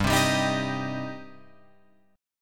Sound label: G Suspended 4th Sharp 5th, Guitar Open F; Gsus4#5 strummed; JGuitar